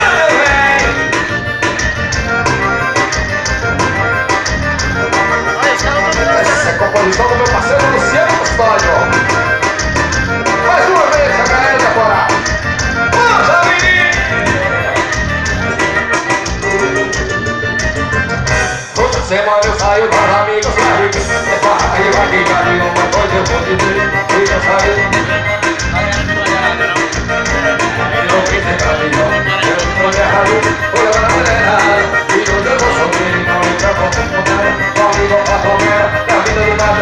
forro ensaio

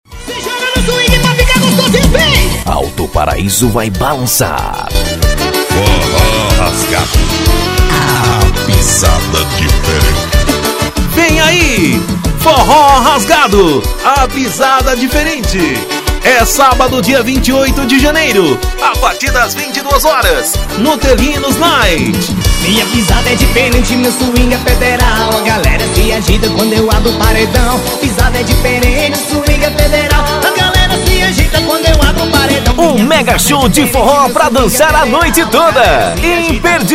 vht forró rasgado

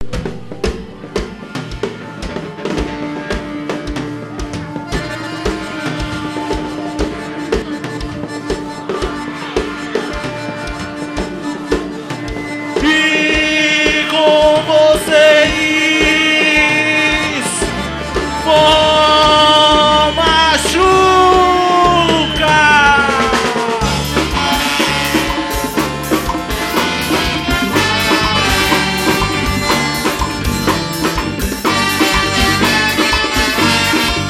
abertura, forró

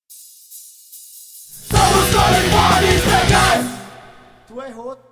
vinheta

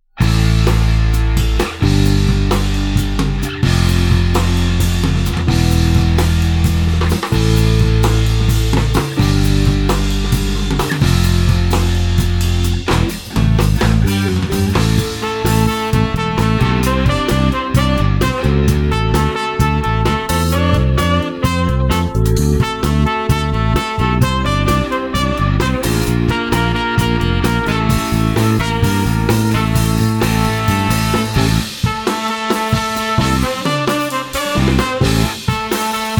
instrumental

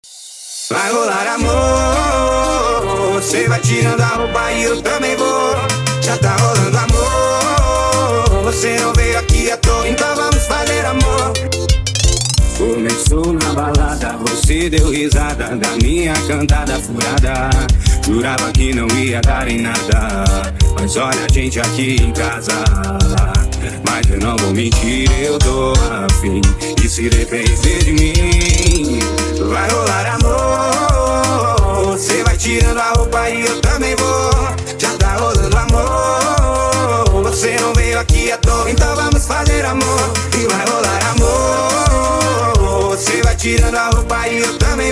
remix brega funk

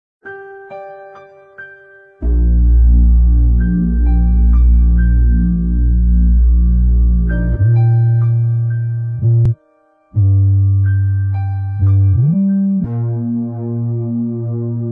Flauta Chinesa

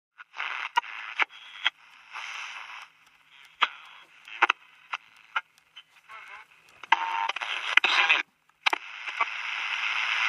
vinheta

